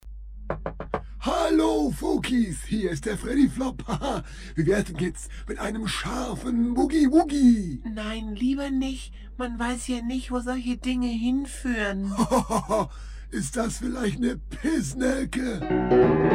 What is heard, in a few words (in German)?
Boogie Woogie